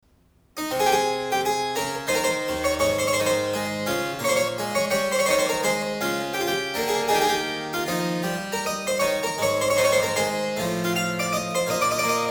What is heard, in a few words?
Courante, harp; Graupner